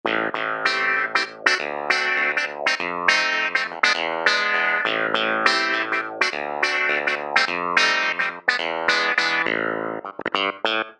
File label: Quacky Clav; Roland SRX-07 Ultimate Keys